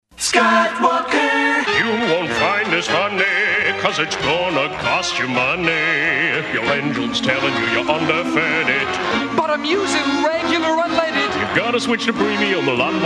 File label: singing